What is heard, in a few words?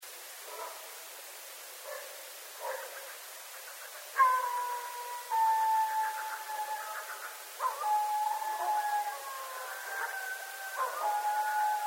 Coyotes; Coyotes (Canis latrans)